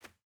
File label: footstep barefoot cloth jump start; Rust